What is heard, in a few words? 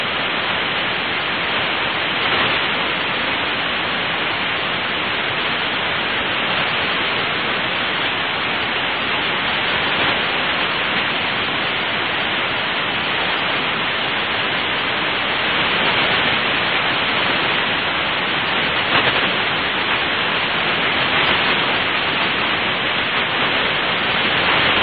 jupiter l burst hf